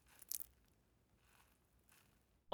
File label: water or blood dribble; prosoundfx